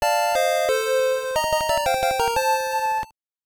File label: Pokemon Capture Fanfare; Pokemon Yellow